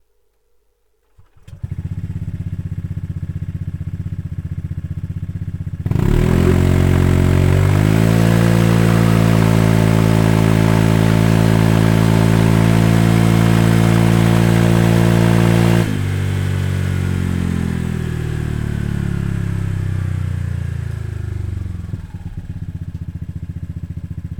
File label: Akrapovic Exhaust System Honda PCX150; racing line